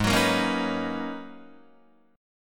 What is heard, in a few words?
Ab Minor 11th, Ab, Guitar, DADGAD